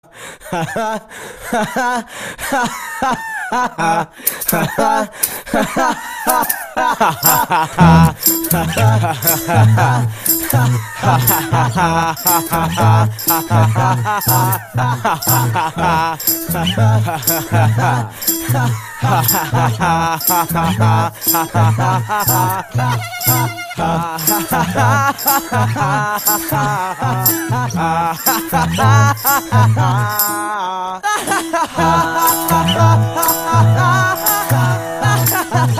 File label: ha haha